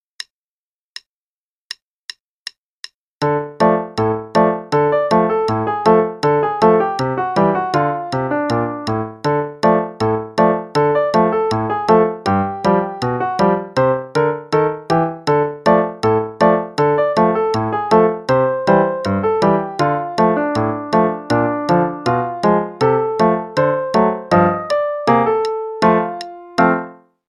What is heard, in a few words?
Play-along, hn=80